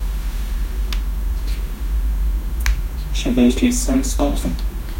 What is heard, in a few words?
lecture room, classroom